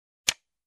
Slot Machine Clink

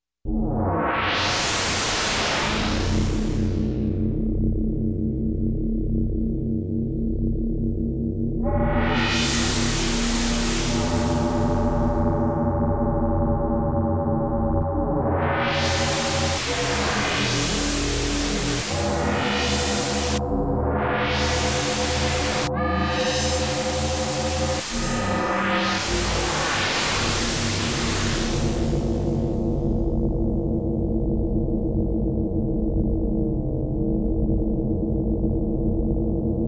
Crazy Sci-Fi UFO synth sweeps

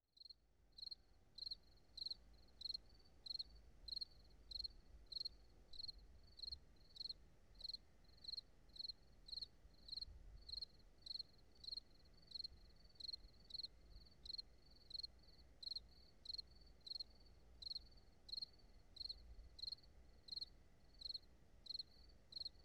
High Desert Crickets

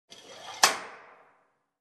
Sliding Door lock click sound

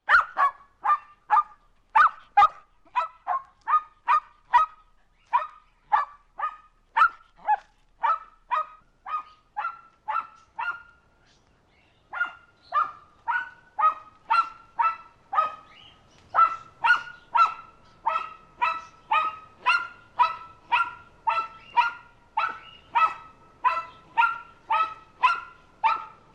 Small dog barking continuously

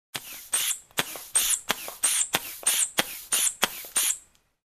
Resuscitator pumping

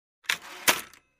Cash Registrar Close

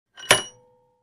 Construction

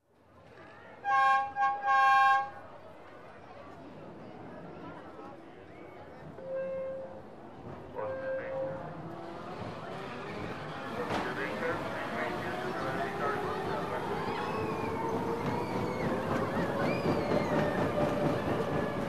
Theme Park Trains

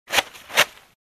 Cross out sound on paper

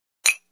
Drinking Glass

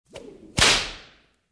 Whip Sound

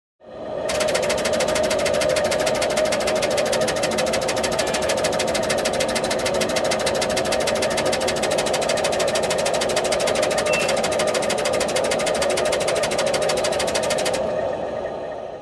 Aircraft Cockpit Gear Rolling

